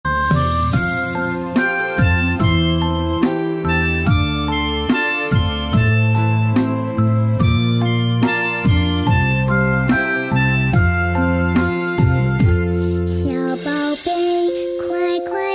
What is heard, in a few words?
chinese lullaby